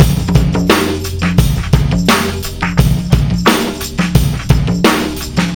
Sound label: Monsterfoot, Drums Beats